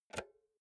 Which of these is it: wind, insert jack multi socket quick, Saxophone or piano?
insert jack multi socket quick